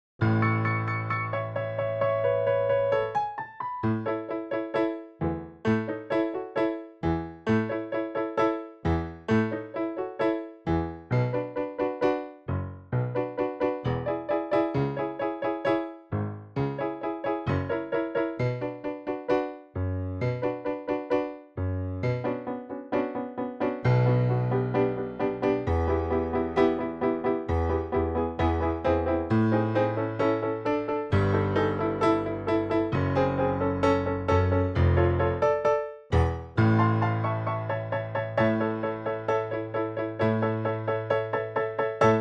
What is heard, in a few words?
backing track